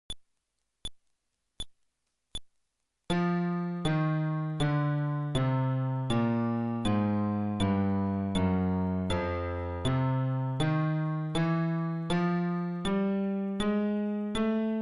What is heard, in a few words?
Scale テンポ80, fretless bass